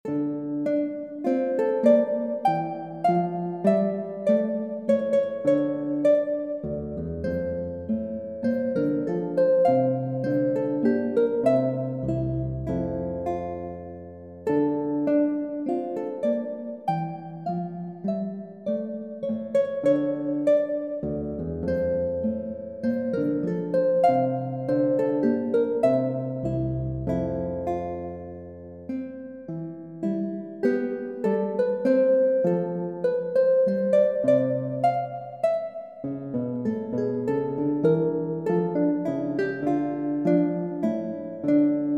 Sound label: aria in d minor, Electronic; juvenilia